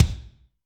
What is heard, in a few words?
Kik Mnstr Mash, Drum Machines Alesis D4